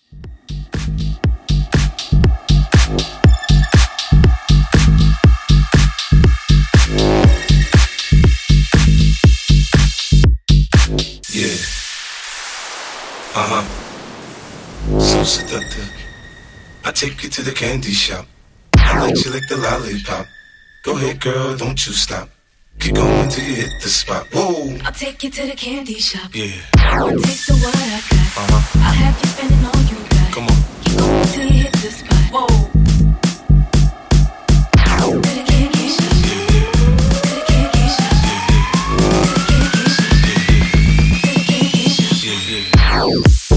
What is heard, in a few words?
Bass House 120Bpm